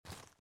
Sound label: run grass; out of reach treasure royale 2020 gamerip pc